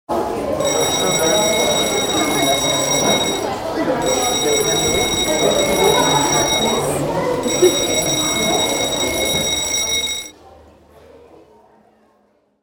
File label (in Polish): dzwonek w teatrze; czterolatki; karty aktywnosci